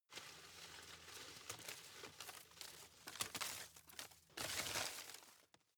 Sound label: drop long soft